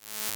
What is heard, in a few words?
Demon Delay; Experimental; Ableton; Convolution Reverb